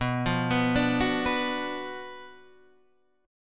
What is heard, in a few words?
Gb Major 7th Suspended 4th Sharp 5th